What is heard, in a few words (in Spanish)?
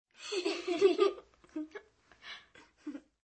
risa niña